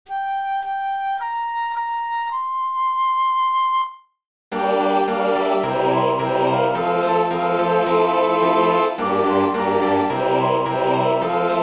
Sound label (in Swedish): Herren är min herde kör; Musik 1980. Texten är hämtad från Ps 23, 1917 års översättning. Arrangerades för kör 2020.